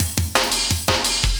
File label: loop, Drum & Bass, 170 BPM